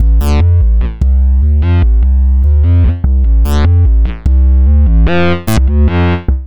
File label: Synth Bass